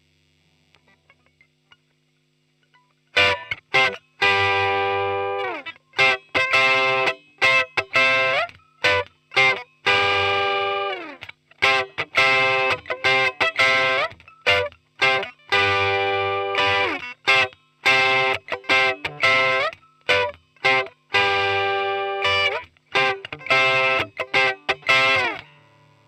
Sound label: Digi Custom Vint